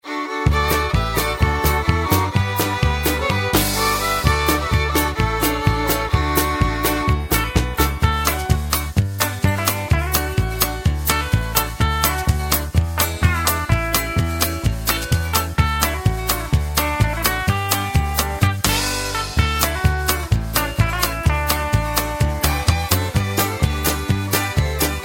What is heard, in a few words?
music